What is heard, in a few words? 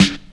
classic hip hop steel snare drum sound G key